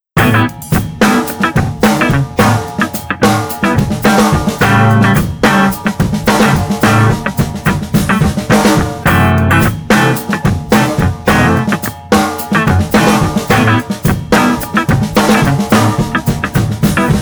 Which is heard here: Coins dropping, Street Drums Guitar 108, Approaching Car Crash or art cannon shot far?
Street Drums Guitar 108